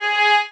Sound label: violin